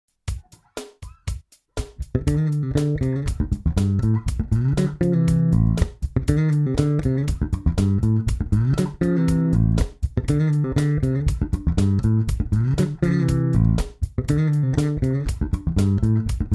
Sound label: A minor fill lick